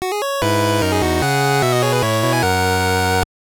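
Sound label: Jingle, Game Boy Color; Chee-Chai Alien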